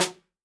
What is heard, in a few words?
snare drum, jungle snare chromatic; Best Service ProSamples vol.43; Real Drum Kits